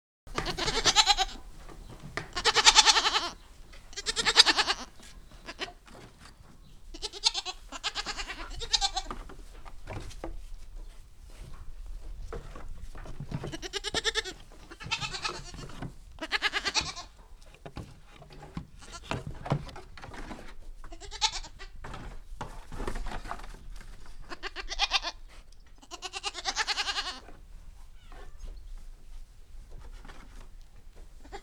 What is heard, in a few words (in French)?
Chevreaux a l'etable, Ambiances Sonores d'Ardeche; Vernoux en Vivarais; Studio Les Trois Becs